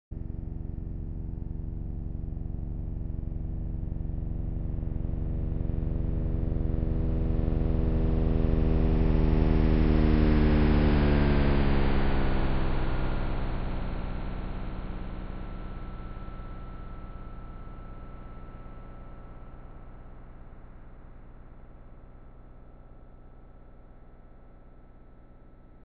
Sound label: auralized Flyover; 26 Equal Time Sections